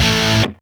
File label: Synths; Vengeance Samples